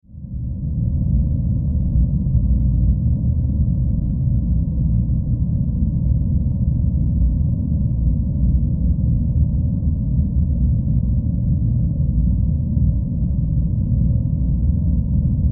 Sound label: Horror Drone Demonic Low Rumble; Evil Sound Effects; Evil (Sound Effects:Horror)